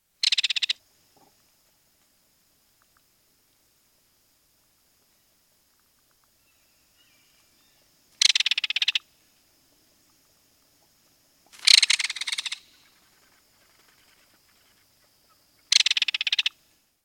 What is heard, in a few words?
belted kingfisher